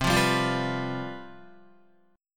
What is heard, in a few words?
C Major 7th Suspended 4th, down, Guitar; strummed